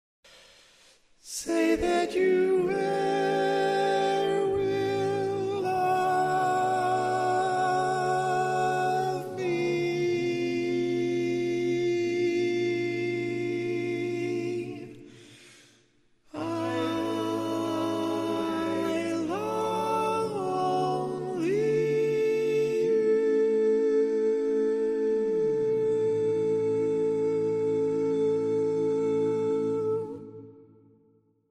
Tenor, barbershop

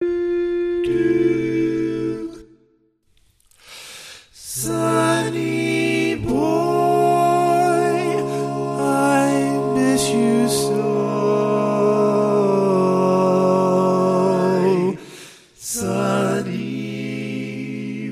Lead, barbershop

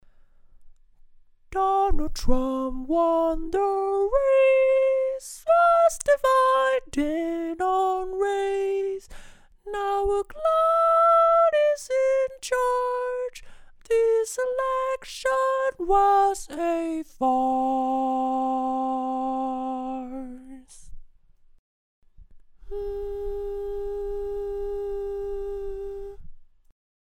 barbershop tags

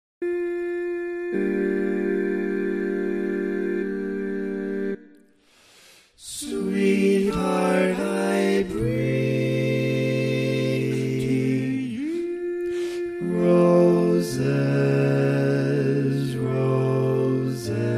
Bass, barbershop

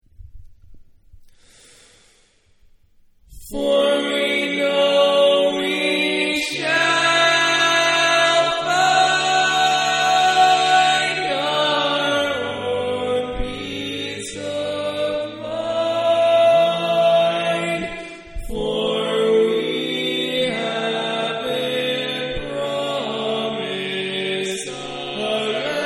Tag Lead, barbershop tags

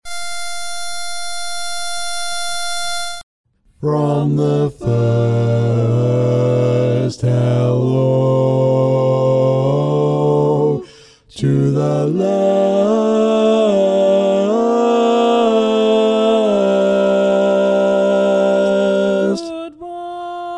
Bass, barbershop tag; Polecat 2 High Tag

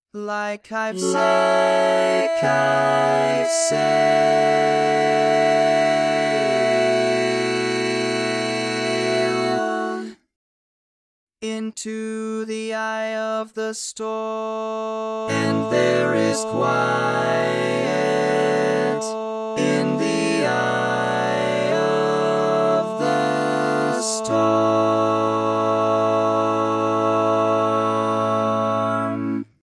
Quiet, Tenor, Barbershop

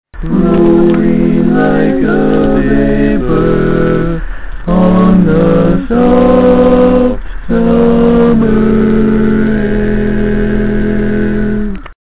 All Parts, barbershop

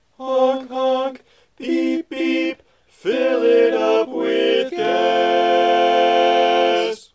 Honk Honk Bari, barbershop tag

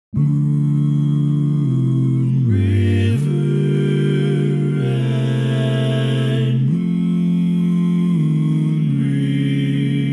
Tenor, barbershop

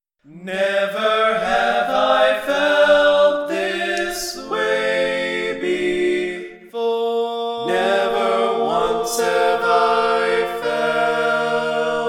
Lead, barbershop

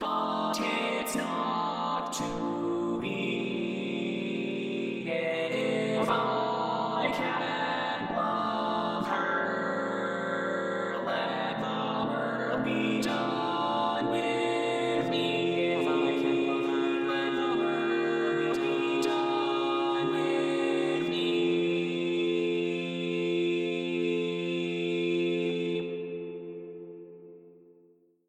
Bass, barbershop tags

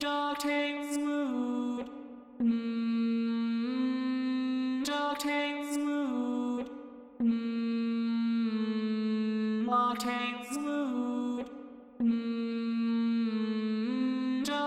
Bari, Barbershop

